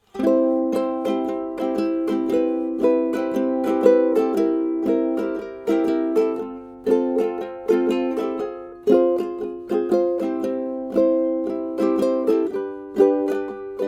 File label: Uke